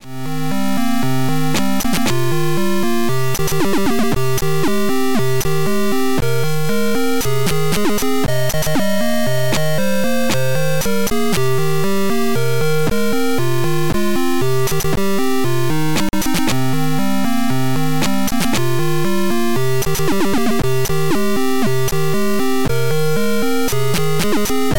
arp; ola

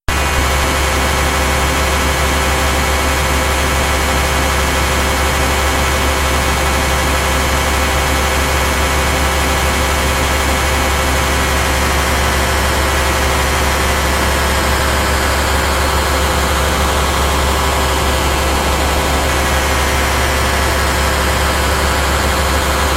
drone thing

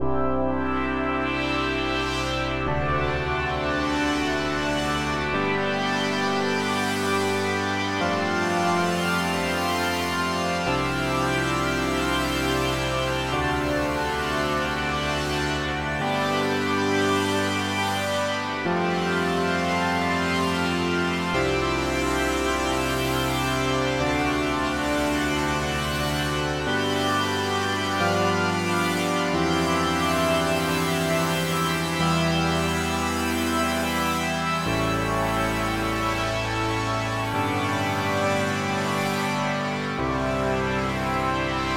10 8 funk

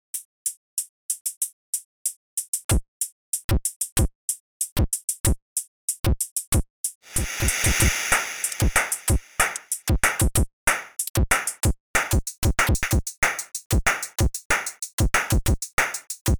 sid2 dnb; weeklybeats 2026

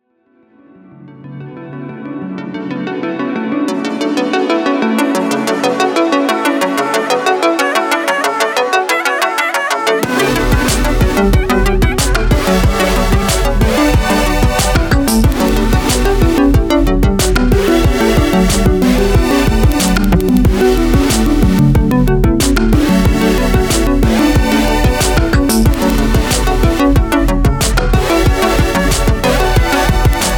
steady; weeklybeats-2016

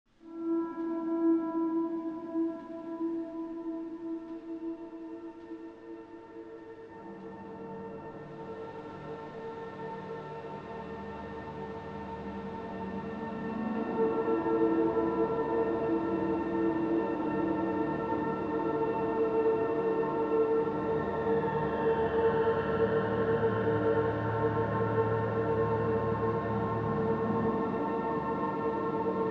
chromium ambient; weekly beats 2020

